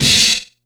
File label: JUNGLE, HipHop MiscPerc; E-MU Producer Series Vol. 8 – Platinum Phatt